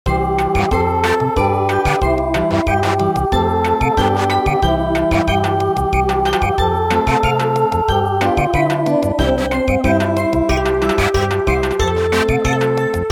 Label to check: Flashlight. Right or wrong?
Wrong